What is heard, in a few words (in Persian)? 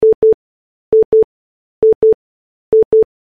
peyghamghir, بوق تلفن; انواع بوق تلفن و معنای آن